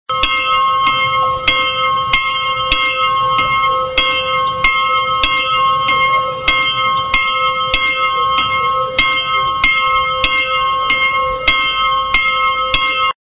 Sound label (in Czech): zvonek; homole